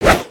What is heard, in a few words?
Sword Slash; weapons; aronwk collection; ndaudio